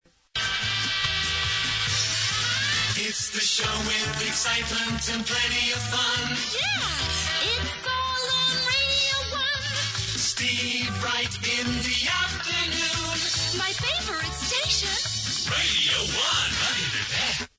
show song; steve wright

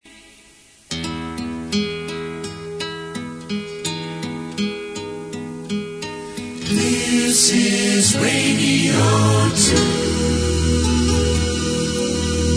jingle

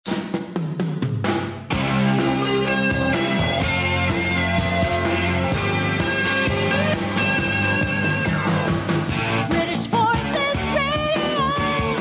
jingle; bfbs; 1992

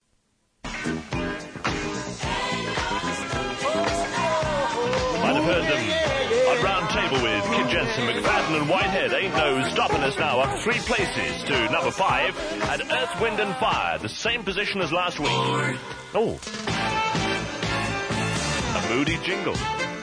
jingle; bates moody; t40

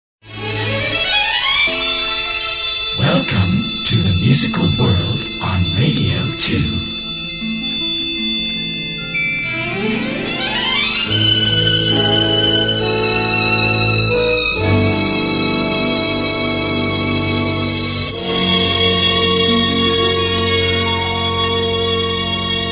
jingle

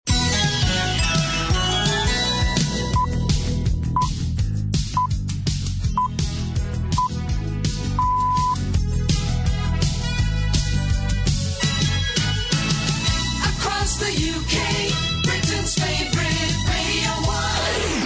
open no voice; adrian john

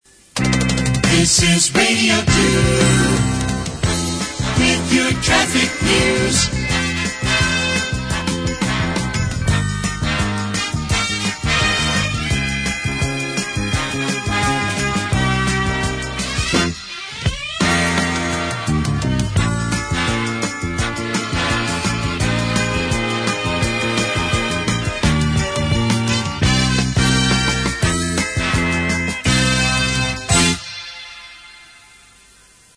jingle

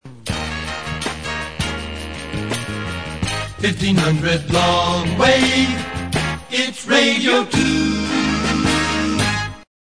jingle

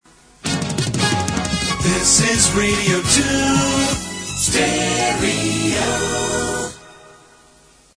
jingle

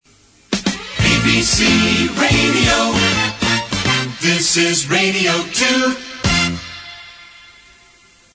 jingle

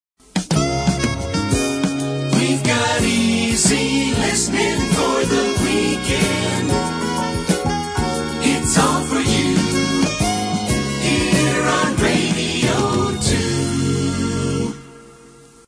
jingle

